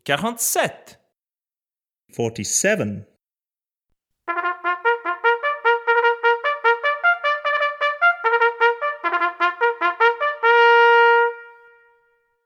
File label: Trumpet